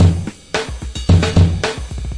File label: military walk, 110 bpm, drum loop; 101 to 110 bpm